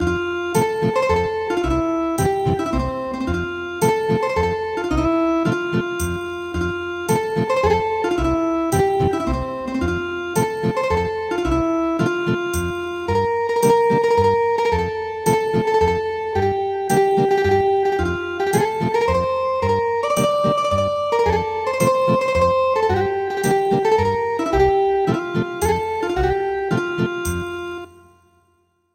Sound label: Ethnic Guitar; Hooray For You Who Turn Your Year